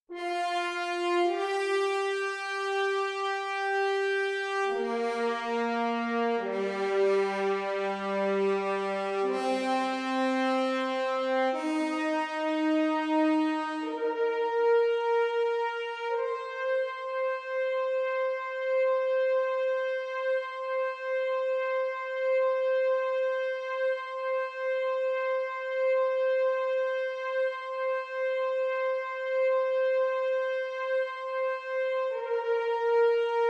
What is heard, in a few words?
March of the Bells